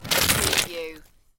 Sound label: Snow and Ice, Hard snow or ice grate